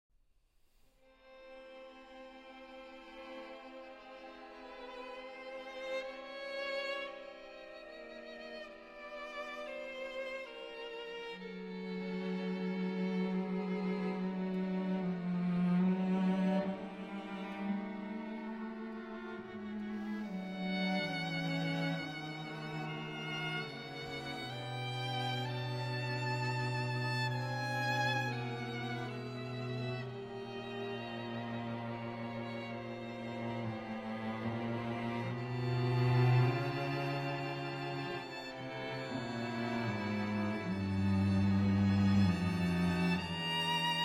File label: String Quartet No. 6 in D Mesto